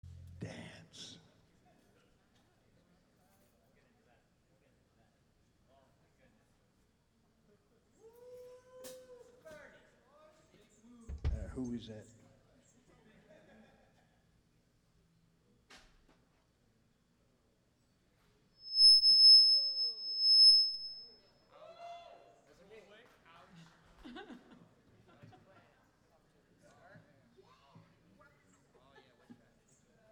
intro tuning

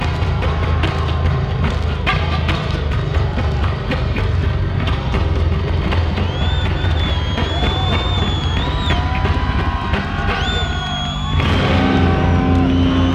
Bass Solo

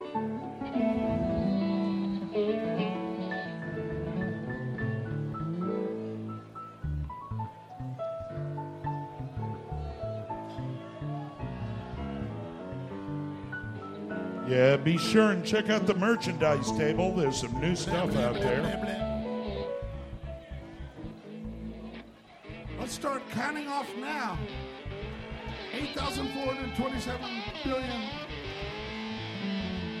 tuning

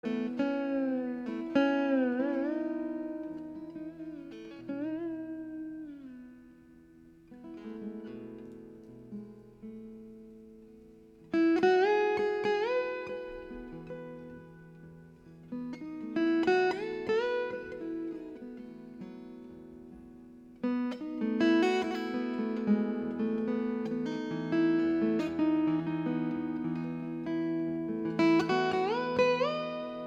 Solo Acoustic

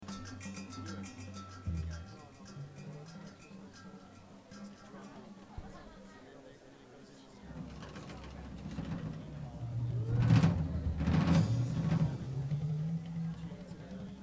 Drums

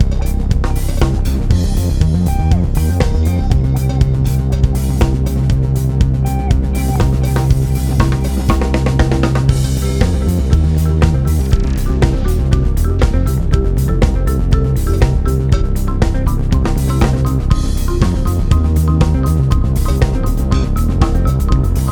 Modular Improv

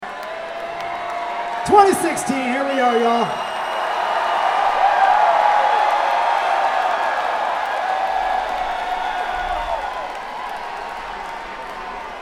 Crowd

